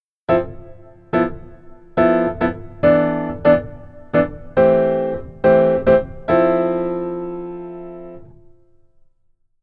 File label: Keyboard Rhythm Pf Only